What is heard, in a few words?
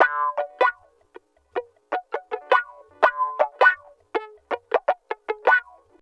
Guitar Loop; Ambient Grooves